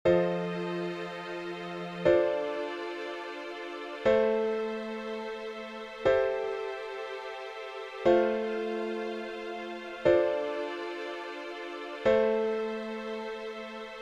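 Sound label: F Major Chord 7ths progression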